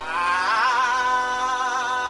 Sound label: vocal ah